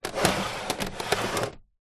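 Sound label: electronic typewriter slide on wood desk; office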